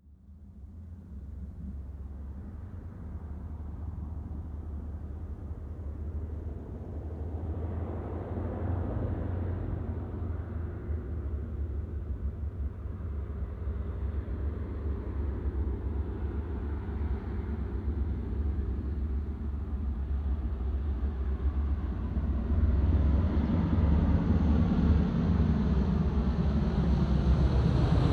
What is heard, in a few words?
propeller plane ju52 fly by overhead distant landing